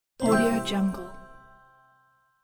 magic wand

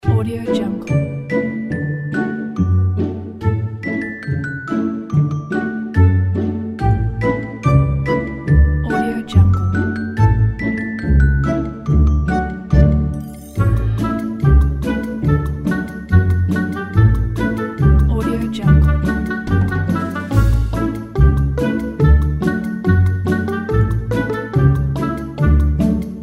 childrens comedic background music

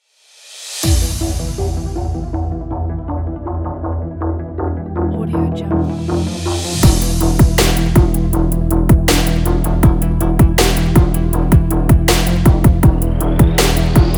sport trap

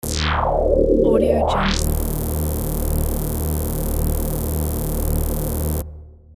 low frequency amplitude modulation

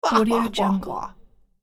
posh English voice guffaw

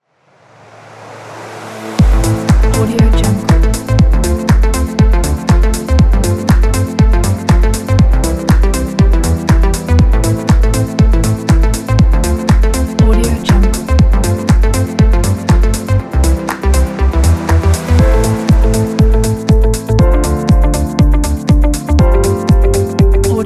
pop

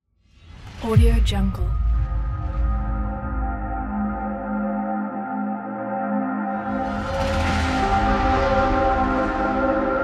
drone aliens

